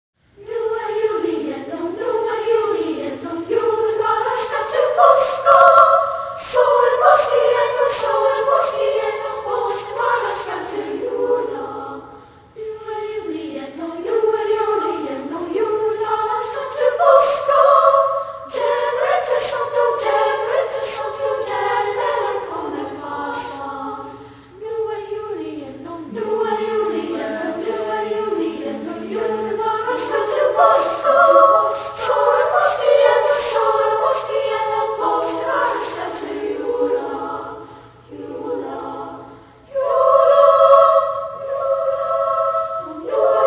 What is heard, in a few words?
folksong